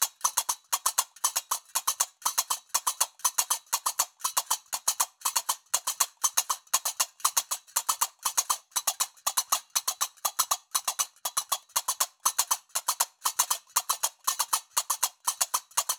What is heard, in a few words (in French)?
loop binaire tempo 120; qaraqab; qraqeb; Maroc